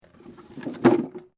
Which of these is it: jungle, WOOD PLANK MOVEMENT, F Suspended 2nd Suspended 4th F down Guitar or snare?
WOOD PLANK MOVEMENT